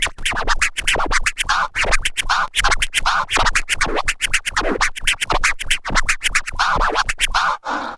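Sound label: SCRATCH TOOLS; AMG Megabass REMIX! VOL-2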